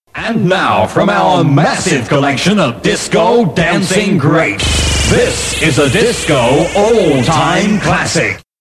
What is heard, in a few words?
Jingles - Disco Classic